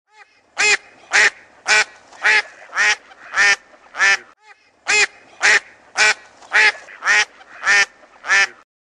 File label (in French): CANARD qui CANCANE le cri du CANARD; Environnement; Sissonne; Audiosflashcodes